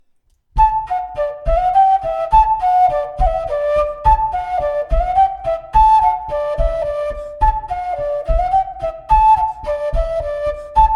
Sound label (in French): Montagnarde; Recueil De Raoulx